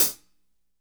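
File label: hat closed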